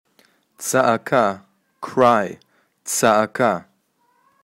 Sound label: Cry